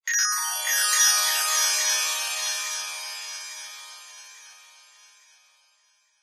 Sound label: twinkling glistening glittery fairy dust sprinkle; zapsplat